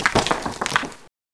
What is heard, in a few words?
bite debris; renesaur